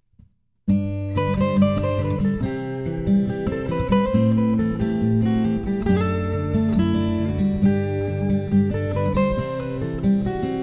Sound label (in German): Akustik Duo